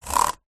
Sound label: technology computer keyboard bluetooth fingers run across keys